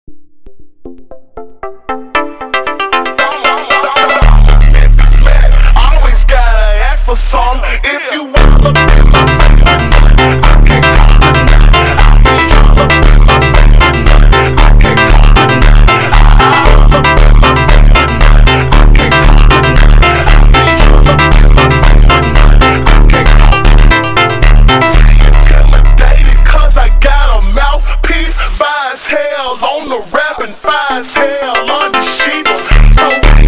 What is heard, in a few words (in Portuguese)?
Montagem slowed